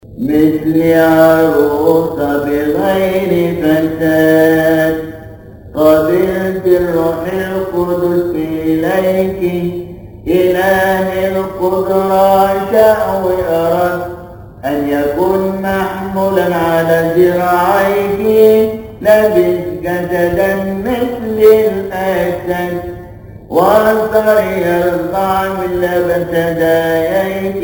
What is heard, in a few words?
Arabic Praise; Mithl Arosa Bigheir Fasad; Kiahk; شهر كيهك; تسبحة عشية; مكتبة الألحان; كنيسة الشهيد العظيم مارجرجس بنزلة السمان